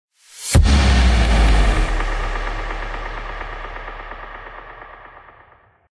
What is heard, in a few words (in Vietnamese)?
am thanh chuyen canh manh me